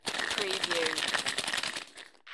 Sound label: Coin Chest Rattle